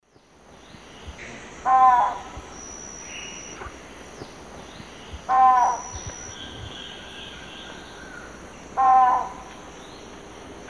Electron platyrhynchum, song; S. America; Eastern Lowlands

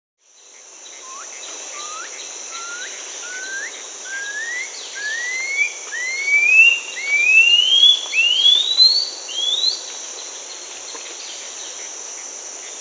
Blue-throated Piping-Guan (Aburria pipile); Peru; S. America

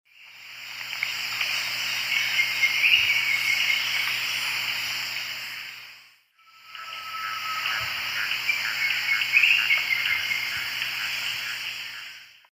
Hemitriccus minimus, alternate song; S. America; Eastern Lowlands

